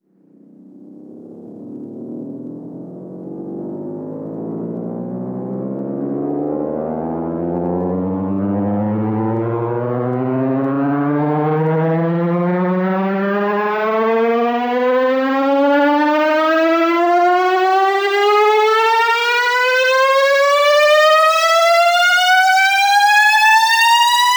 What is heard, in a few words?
Sweeps Long